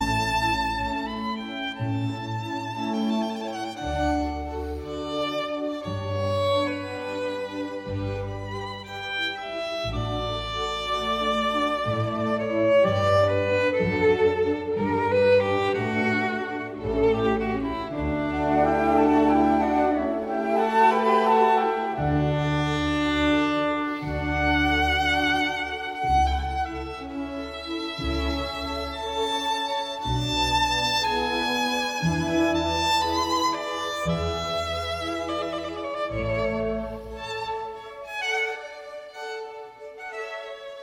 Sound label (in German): violinkonzert nr 3 g dur kv 216 adagio